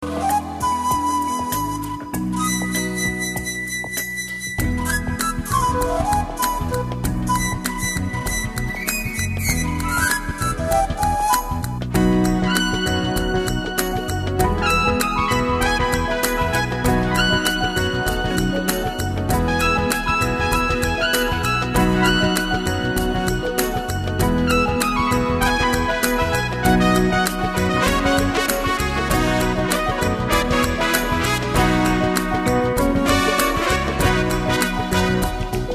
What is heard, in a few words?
Kool Jazz; bluesjazz